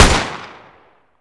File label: ak47 fire